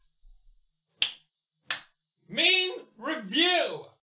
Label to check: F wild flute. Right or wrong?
Wrong